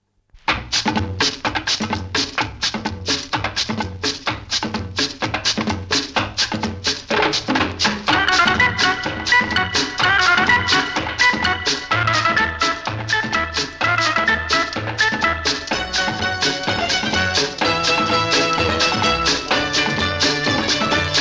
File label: Instrumental; C.I.D. 909